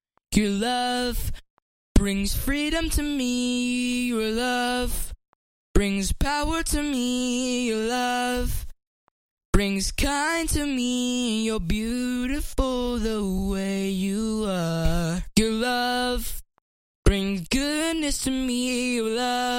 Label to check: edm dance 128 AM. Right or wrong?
Right